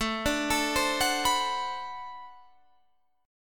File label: D 13th, guitar chord